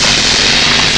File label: jet gun, weapons